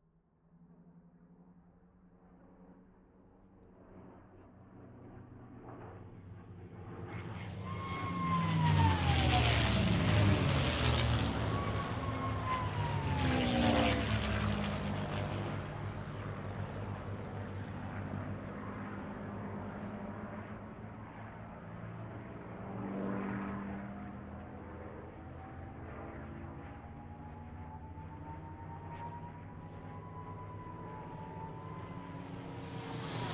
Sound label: warbirds